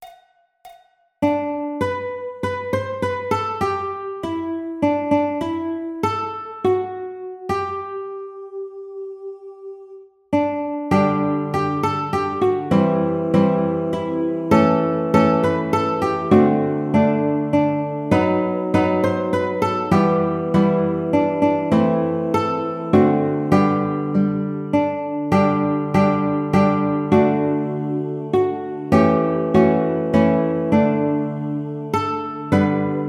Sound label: We Wish You A Merry Christmas Baritone